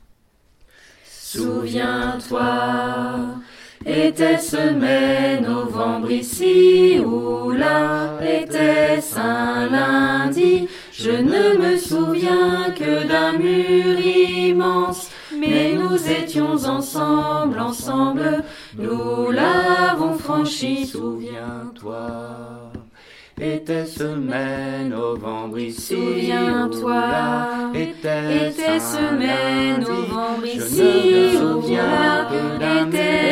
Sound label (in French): ensemble 3 voix